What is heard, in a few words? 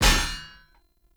Sphere Polearm Medium Parry Impact; Weapon Impacts; Player; Combat